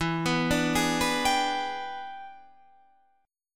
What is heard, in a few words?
E 7th Sharp 9th, chord; E7#9 arpeggiated; JGuitar